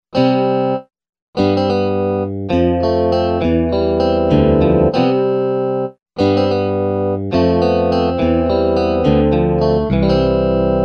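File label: distortion + reverse gate, Yamaha REX50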